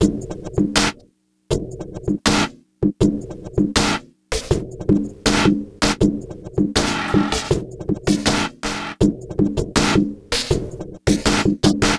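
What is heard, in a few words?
Loop, beats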